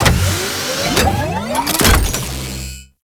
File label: Ship Electrical Socket Open; Gameplay; Ambience; Warframe; Corpus Ship Remaster